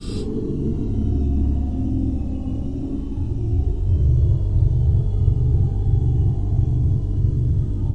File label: atmospheric noisy thickener; Atmospherics